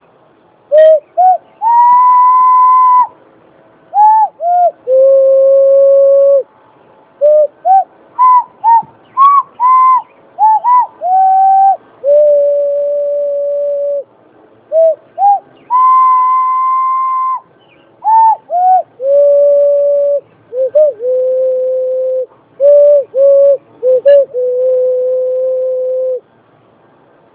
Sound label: theme, Handwhistling